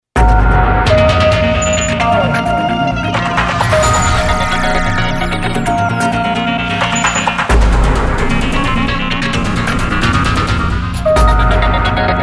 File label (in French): generic mystère